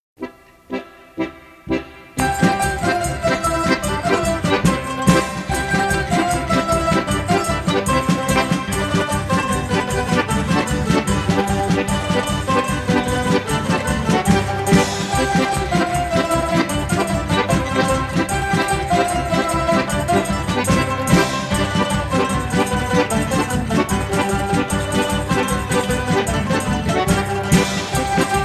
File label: Polka